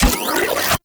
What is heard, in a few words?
Charge Up; Crp BFG Vandal